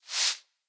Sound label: Broom Sweep; blue archive